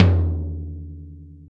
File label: gretsch tom, deep house single hits; Zero-G Deep House